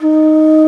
tenor bansuri d#3; Planet Earth; Digital Sound Factory Planet Earth

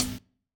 KIT, Dance Mega Drums, HIP; Best Service

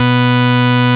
Farfisa B0; Sonic Reality; Vintage Timetraveler

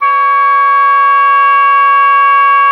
OBOE3; Xsample VOL-6

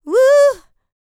licks, vocal, dance; ILIO; Vocal Planet

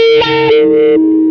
FEEDBACK, Distortion Gtr; PlatinumPhattCD2; E-MU Producer Series Vol. 8 – Platinum Phatt

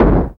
KIK INDUS, Dance Kicks; Roland L-CDX-01

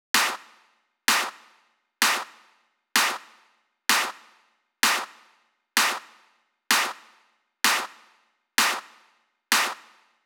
128 BPM Clap; Vengeance Essential Clubsounds Vol.5; VEC5 Demo Constr Kits; Slide Kit; Root G; Drums & Perc

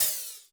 Dance Club, Drum Machines, Korg 01W

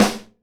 SNARE DAMP, Live Snares

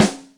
Brassy, Yamaha DTXpress Acoustic Snare

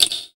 FX Dance

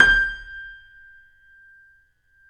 KEY G 5, YC7 Piano mf; Roland - Rhythm Section

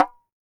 CLAY FRAM, CAMEROON; AKAI S6000 CD-ROM - WorldSounds; CAMEROON PRC

